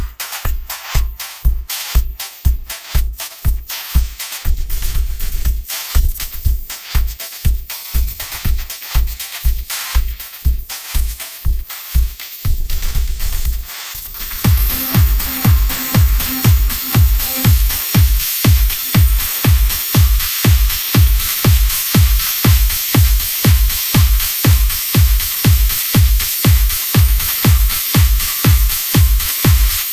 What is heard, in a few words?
pop anthem; ai-generated